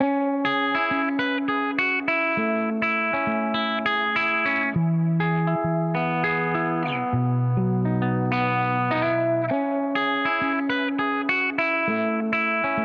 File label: 101bpm Cm; Calico Drip